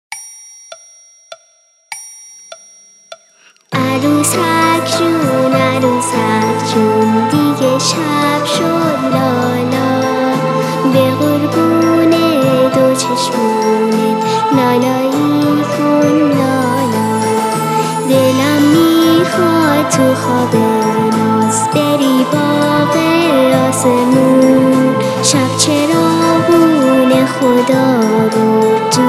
Vocal

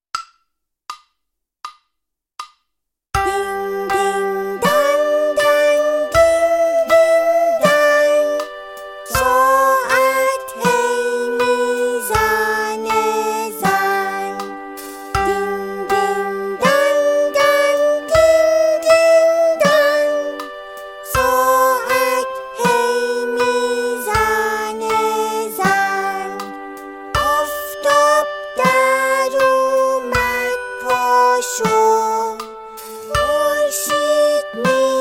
Ahange Saat ba vocal

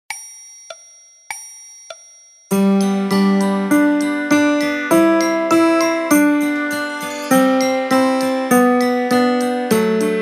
Twinkle Twinkle Little Star in G 2 Piano Orch

